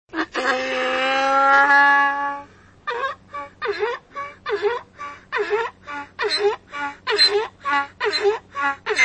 donkey braying; iphone ringtone

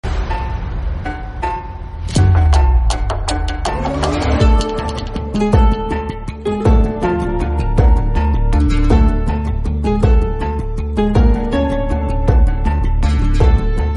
bgm ringtone; tandav web series

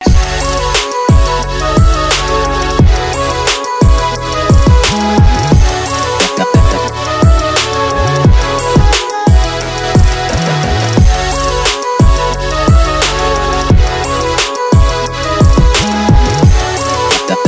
Trap Future Bass Music Instrumental

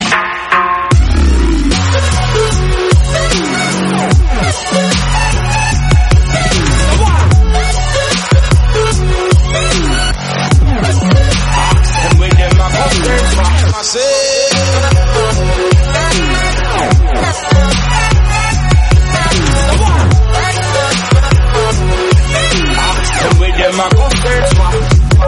bass beats

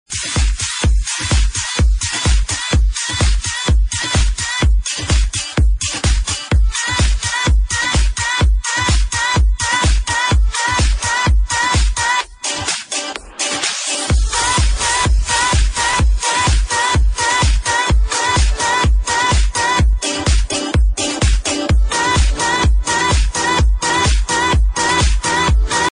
ringtone

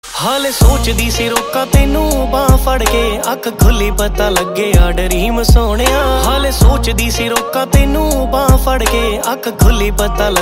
punjabi songs

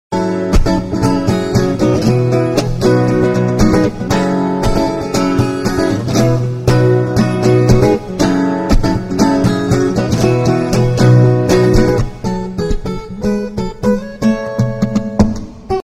guitar

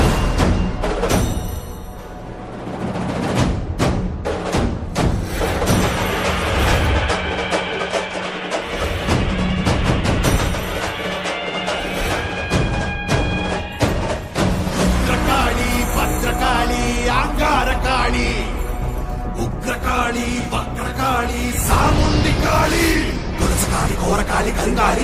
theme ringtone; laxmmi bomb

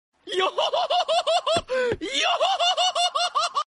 Laugh Sound Effect; One Piece; Brook

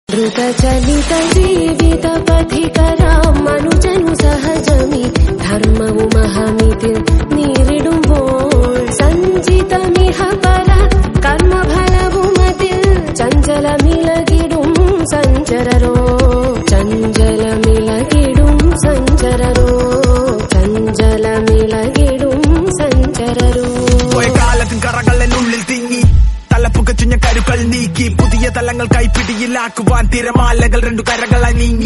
Song Ringtone

